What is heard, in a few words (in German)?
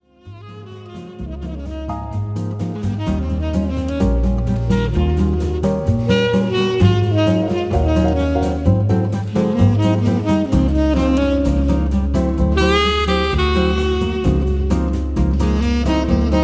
Tenor Saxophon